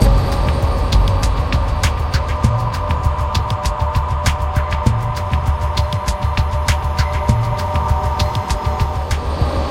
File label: Tension; Mirror's Edge (2008)